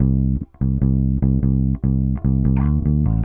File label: Bass, Indie Rock